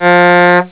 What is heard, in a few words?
BULLHORN; Kiddie Ride; Bulldozer (Airbrake, Bullhorn); Kiddie Ride Sound & Control